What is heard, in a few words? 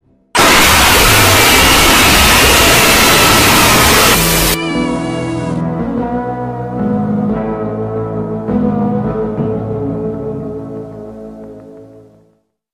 screamers clamoring with halloween music